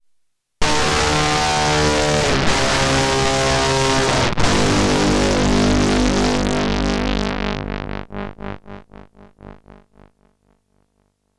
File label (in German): Erica Fusion Plasma Drive Gitarre